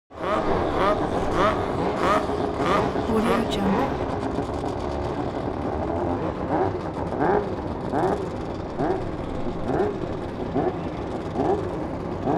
transportation racing car f1 multiple rev warm up distant